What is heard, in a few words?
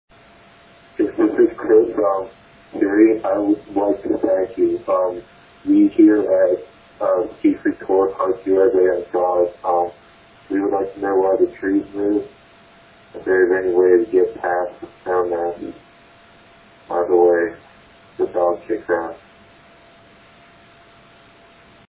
ski call